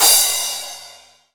Crash Cymbal, MONO CRASH

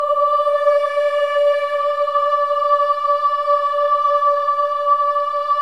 OH EH, Symphony of Voices; Spectrasonics

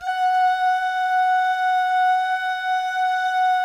DOO MIX, Vocal Planet Jazz, GUY GAL

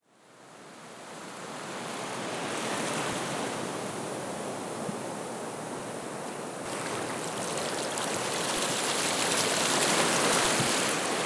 SEA

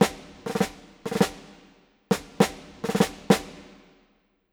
Snare, Orchestral; Sonic Foundry (Sony Creative Software); Orchestral VOL-2; Modern 06

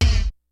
KICKS; Kid Nepro; Elite; KICKS SNARES

